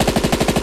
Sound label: Machine gun fire burst